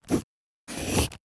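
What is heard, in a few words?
handwriting wax crayon; soundbits-soundfx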